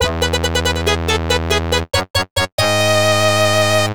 Victory!; gemfire genesis

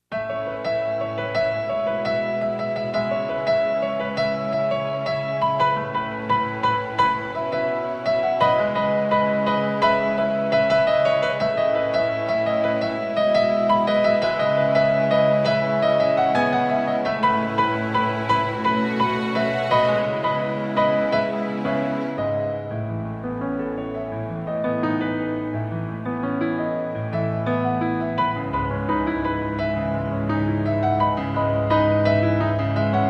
piano ver.; TalesWeaver Soundtrack Memorial Edition 2023

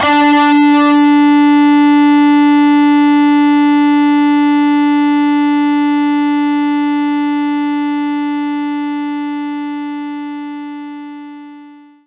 Overdrive Guitar D4; knights try

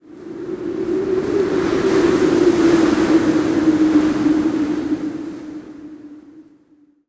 wind; Level2 Ice Fields

